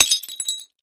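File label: Craft Vase Type 4 Crash, housewares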